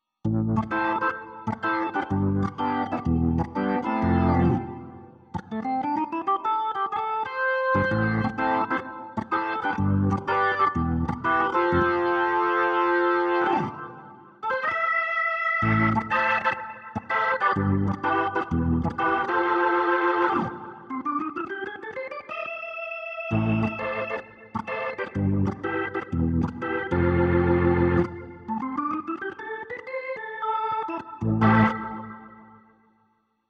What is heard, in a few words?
Nord C2D B3; Nord iverse Settings